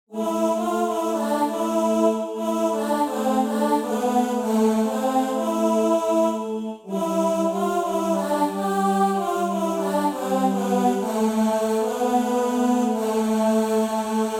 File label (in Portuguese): organo melismatico